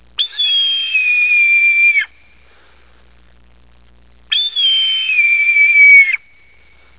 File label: redtail